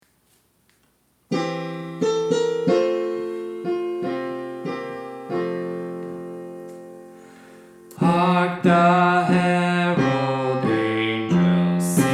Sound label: Bass